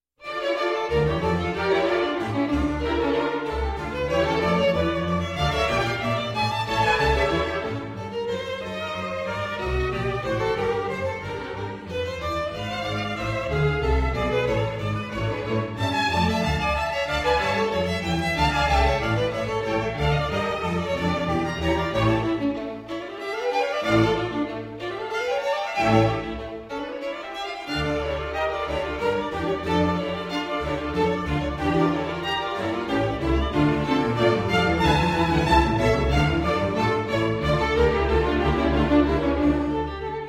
Concerto for Two Violins and Orchestra in D minor, Allegro; BWV 1043